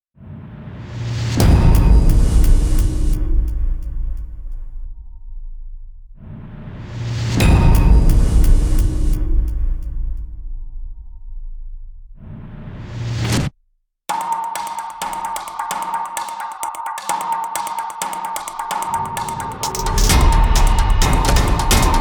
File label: drums fx